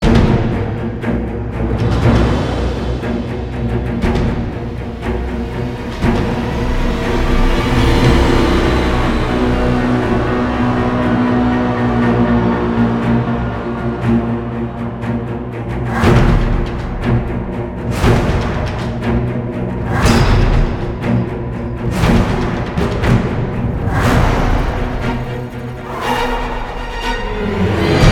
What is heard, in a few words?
Global Cave Combat Intensity; Dragon Age Inquisition